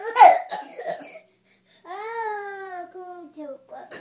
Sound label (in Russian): barabannaya drob gorlovaya, zvuki kashlya rebenka